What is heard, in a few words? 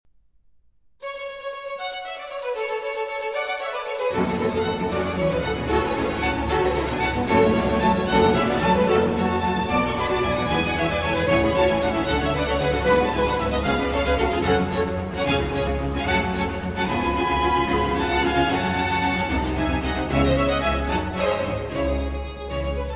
Concerto for Strings and Continuo in G minor